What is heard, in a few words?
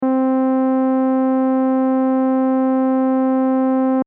dry synth